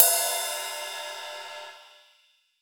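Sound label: RIDE, Drum Machines; EdgeSounds; Drum Mashines VOL-1; X-DRUMS KIT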